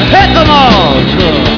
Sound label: hit